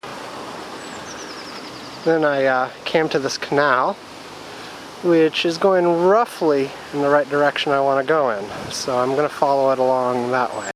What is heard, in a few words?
Canal; Aylesbury trip